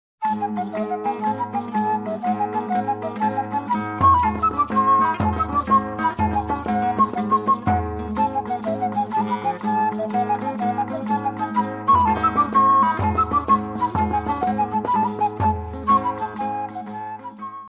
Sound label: Saltarello